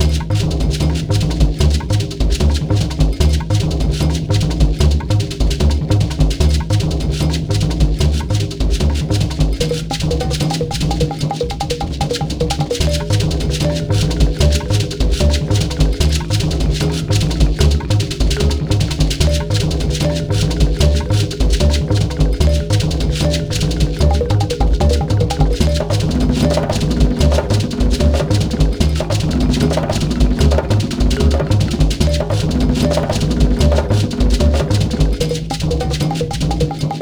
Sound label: music forest bpm150; forest09 var3